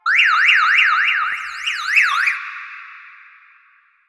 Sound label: Car Alarm Destroy; Ambience; Props; Warframe; NineteenNinetyNine